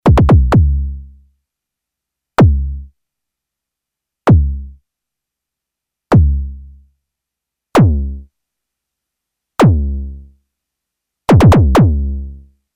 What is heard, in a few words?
kickdrums, behringer model d; Kompakter Desktop Synthesizer